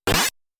Sword Cutting; The Tower of Druaga

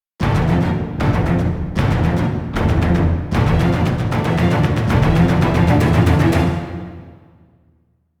Garden Combat Intro; The Witcher 3 Wild Hunt; Skellige; Freyas Garden

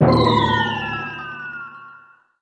SFX Ball Ob Area; pangya fantasy golf pc

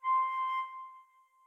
Flute C1; Phoenotopia Awakening

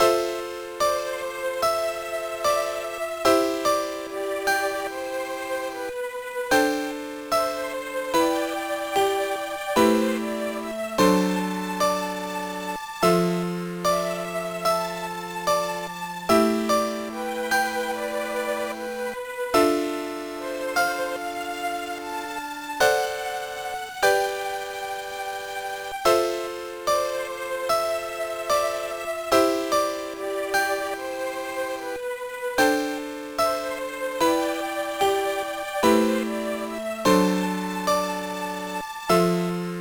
BGM; Licca-chan no Oshare Nikki; GBA

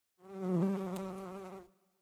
Amb Birdvillage Bees

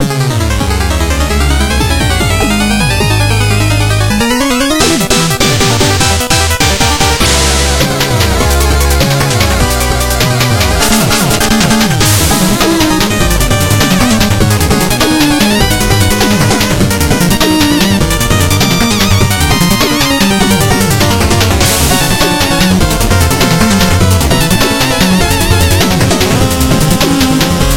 Music Boss; Boss Spectre; Shovel Knight King of Cards

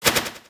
sword; Fight Knight

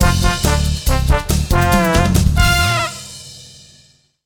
Jingle Victory; rayman arena; Globox

